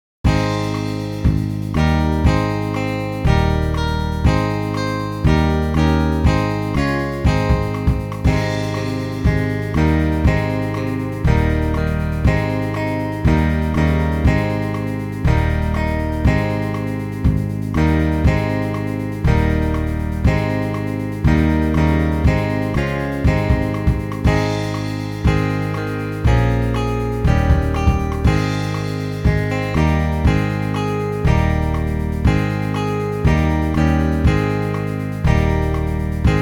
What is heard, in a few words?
Eb Moving Up A, brass